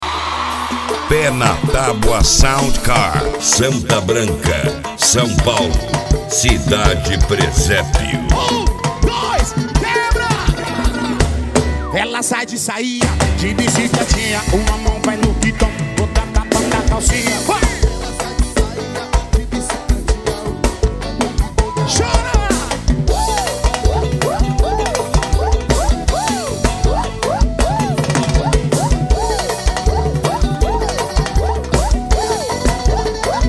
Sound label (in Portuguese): sound car carnaval